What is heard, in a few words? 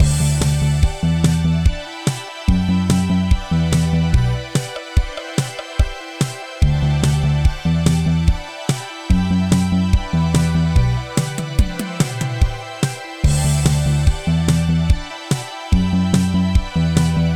level main loop; atelier godot; AlphaRaquette